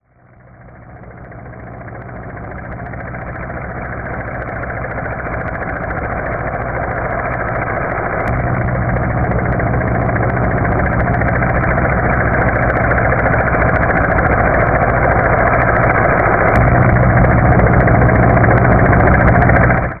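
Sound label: Helicopter Approach; Mike Koenig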